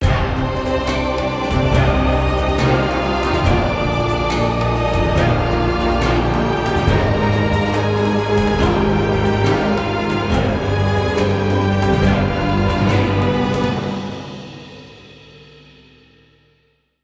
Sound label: Battle Drums; Necropolis